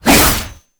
Shield And Sword Whoosh; Warframe; Grineer; Mortifor

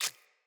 Root Spider Step Water, Foley; Animals

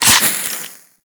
Assault Rifle Reload; weapons; Warframe; Grineer

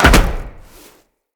land heavy metal, footsteps; Characters; Tenno

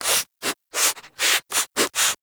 Armadillo Vocal Sniff Multiple; Warframe; Characters; Hunting Critters

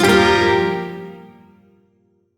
Shawzin Blues Chord; Warframe; Duviri

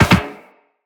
Land Soft Metal Perforated, Footsteps; Characters; Operator

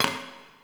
Idle Heavy Hoof Stomp

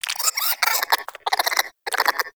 Player Lure Call; Warframe; Deimos; Animal Lure; Cryptilex

